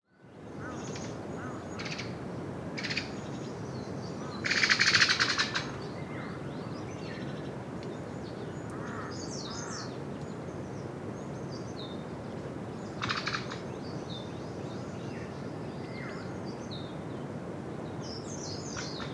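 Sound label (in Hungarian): fehértorkú feketerigó hangja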